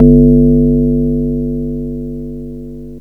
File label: cool, bass; Giga Samples Collection; cx5